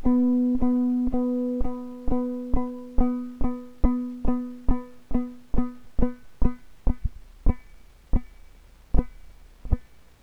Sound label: ChangingGain; Physical Effects